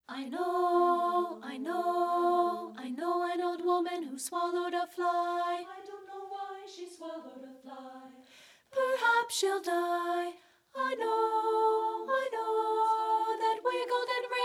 I KNOW AN OLD WOMAN SOP 1; treble; partbypart; SSA

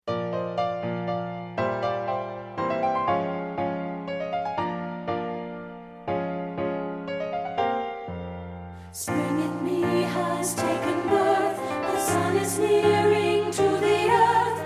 We Celebrate the Birth of Spring SSA VOX

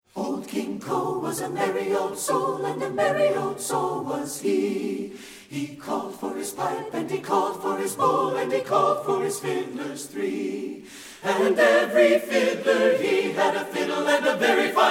VOX; satb; Old King Cole; partbypart

